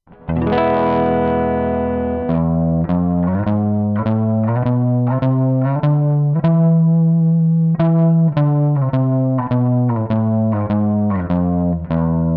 shred

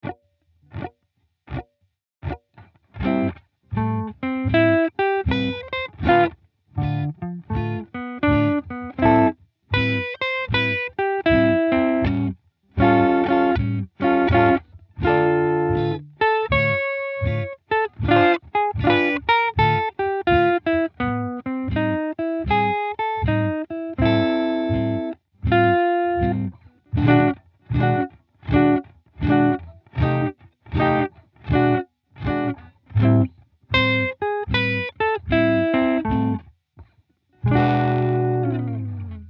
blues track slow version

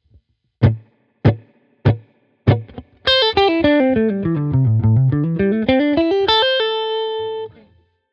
blues, guitar

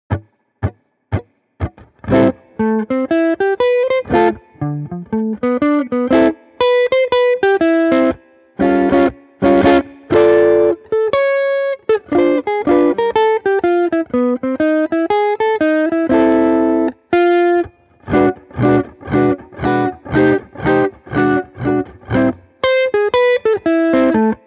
blues track

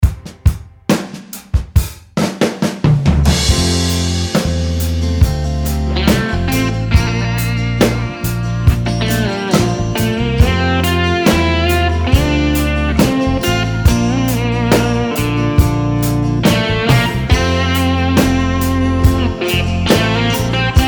slide solo mix

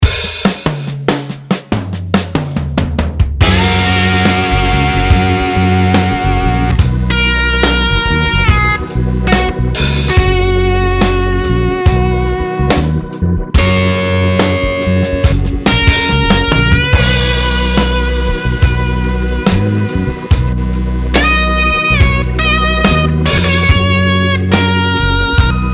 slide solo

